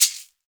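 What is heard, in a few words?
shaker, percussion; ILIO; Synclavier Percussion 2; World & Orchestral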